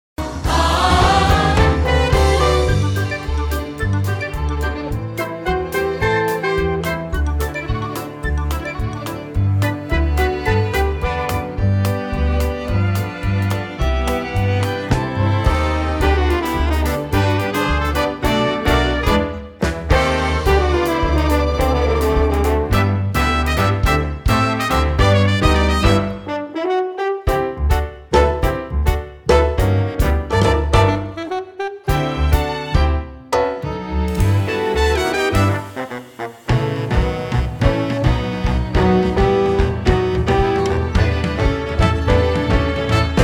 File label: Audio Instrumental; audition